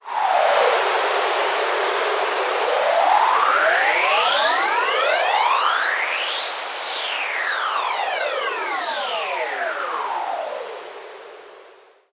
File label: forcefield